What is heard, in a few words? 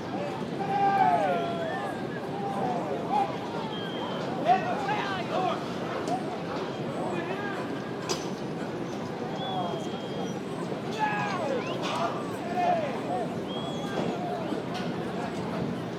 crowd shouting; background